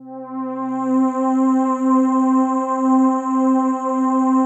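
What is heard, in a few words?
PAD, atmospheric pads; Best Service; XX Large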